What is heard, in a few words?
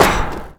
catwalk run